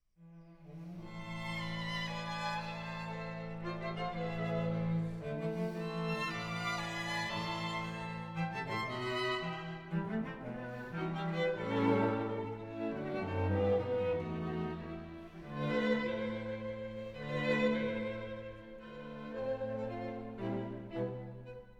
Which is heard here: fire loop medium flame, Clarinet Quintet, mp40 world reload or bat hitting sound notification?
Clarinet Quintet